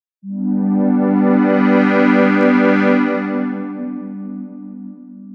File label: Seen Voices; jup8